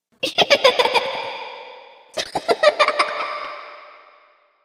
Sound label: ghost giggle